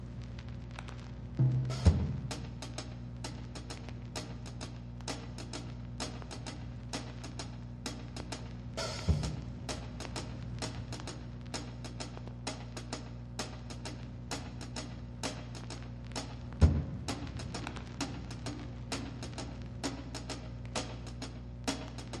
Drums

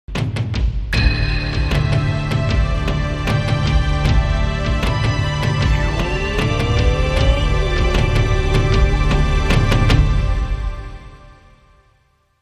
Japanese Drums

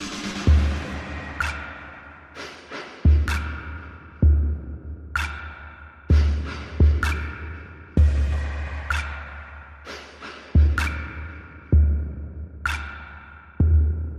Quest Drone Only Rhythm

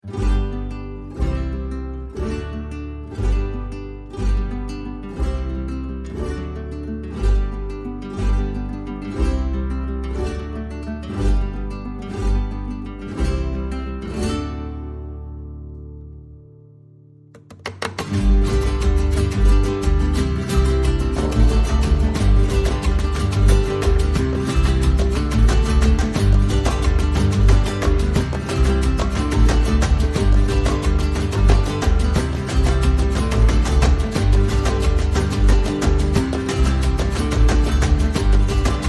No Melody

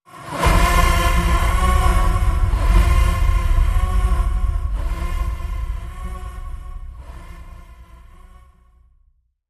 Demon Scream

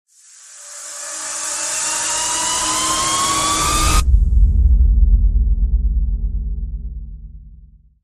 Rise Short; Rise Short Infinity

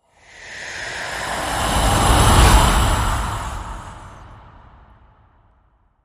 Inhale Exhale Long Whoosh

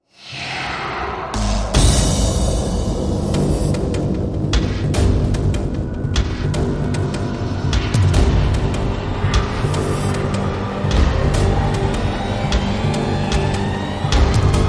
Hit and Hold Riser

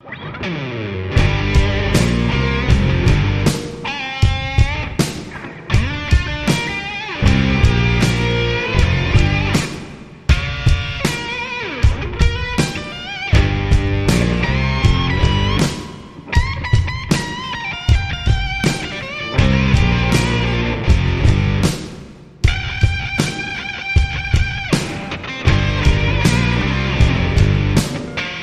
Rock

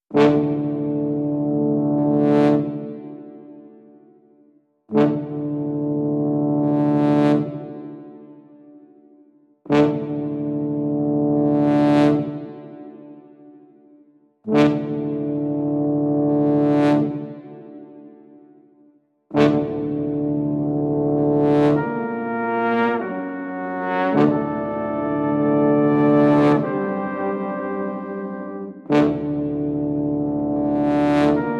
Tension Horns, Reduced

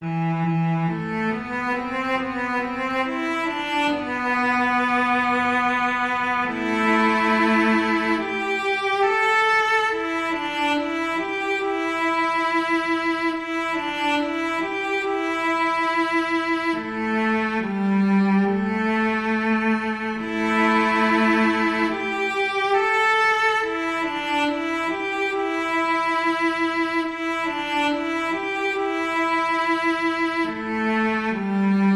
Tension Heart Strings Cello Only

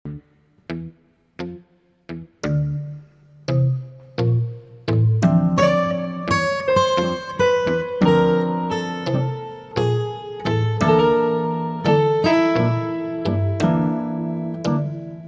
Tango

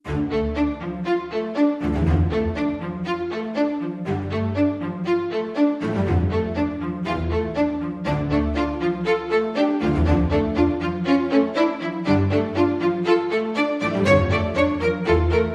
Staccato Only

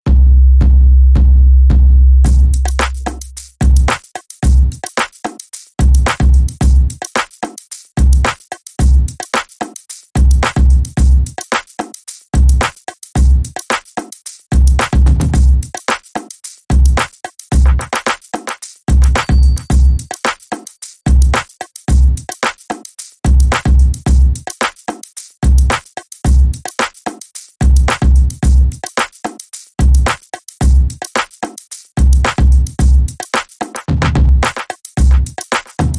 Imo Beat

